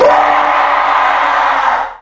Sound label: mace scream; boss; sewer